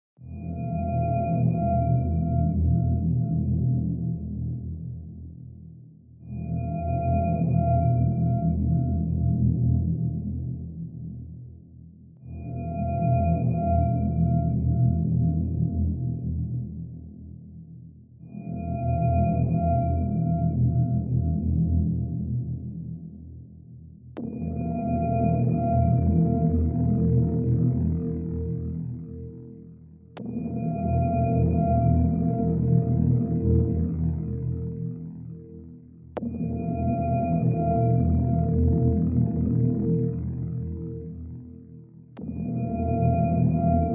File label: dark Pad ModW; nehybnost